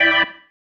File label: Stab One Shots, Organ